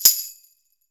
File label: tambourine